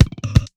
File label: Kick 130 Shuffle; One Shots; Kicks; Ghosthack; Abstract Drums Volume 2